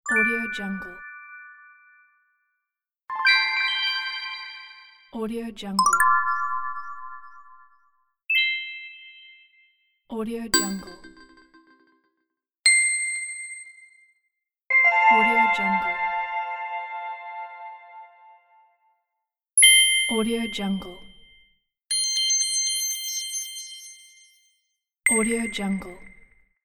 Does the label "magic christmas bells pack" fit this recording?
Yes